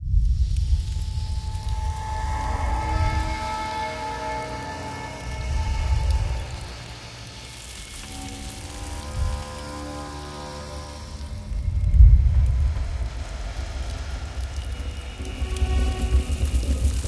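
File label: oven; House of 1000 Doors Serpent Flame; H1000D3 pech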